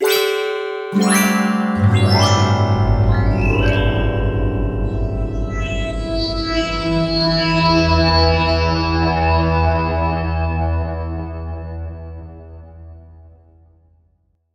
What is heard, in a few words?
ZING all; eShop